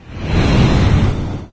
whoosh large, near miss; Source Engine; video game; physics

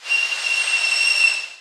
station train squeel; ambient; Source Engine; video game

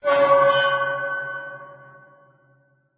train squeal; source engine; portal2

